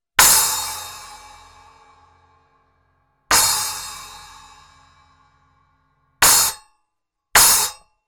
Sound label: SOUNDCASTER 10, CYMBALES